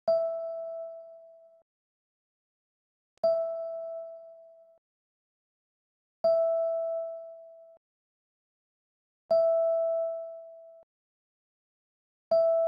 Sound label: alarm transition; BlackBerry; OS-10